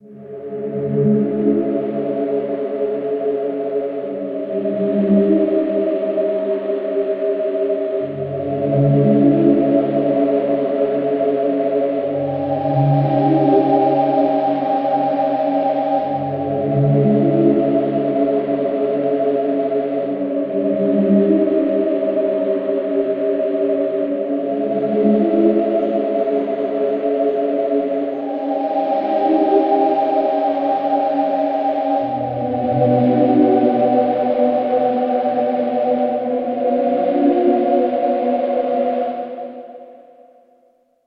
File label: Music; CS Ireland